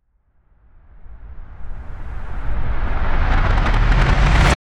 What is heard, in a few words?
Riser